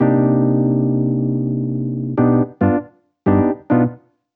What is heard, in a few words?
Jazz Keys El Piano 110 C, Chordy Loops, 110bpm